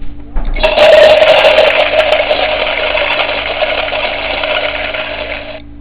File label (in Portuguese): descarga; banheiro